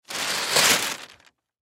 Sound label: Gift Bag Open Shake Out; Shaking; Foley; Blastwave